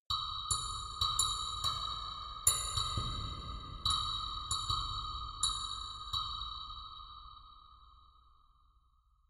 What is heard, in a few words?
ambient synth grain click